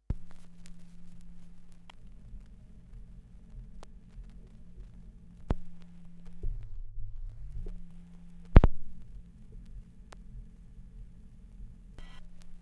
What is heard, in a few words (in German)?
Schallplattengeraeusche (78rpm); wendelrekords 1.2